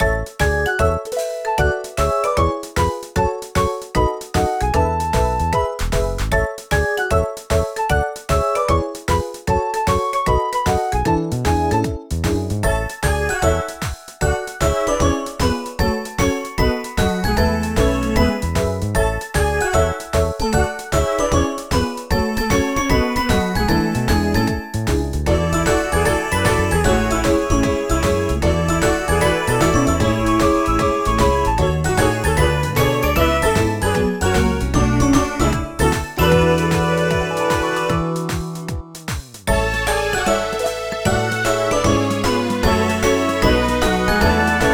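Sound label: background music trial; spinach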